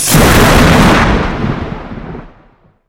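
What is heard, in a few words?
lightning flash and strike; zvuki buri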